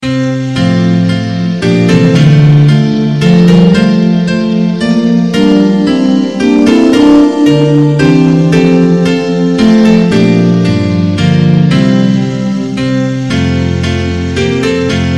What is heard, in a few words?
Cradle Song; Away In a Manger